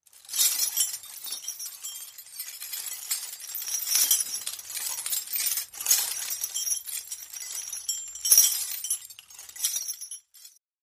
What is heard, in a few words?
Chains Large Metal C, Heavy Metal Movement